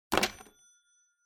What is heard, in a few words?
Rotary Phone Hardware Receiver Pick Up